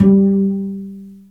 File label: BASS PIZZ G3; SOLO PIZZ ST; Greytsounds VOL-1